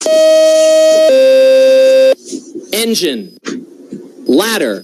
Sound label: FDNY tone